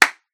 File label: Comedy Cartoon Clap; ProSoundCollection v1 3